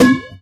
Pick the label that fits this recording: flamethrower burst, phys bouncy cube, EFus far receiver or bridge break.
phys bouncy cube